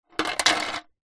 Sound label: ice cubes movement slide in plastic tray; food drink